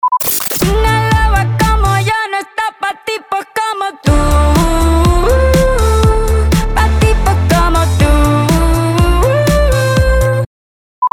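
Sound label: 122BPM D minor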